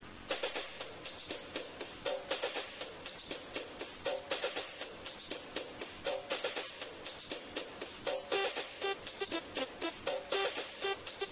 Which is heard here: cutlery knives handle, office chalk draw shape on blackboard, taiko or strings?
taiko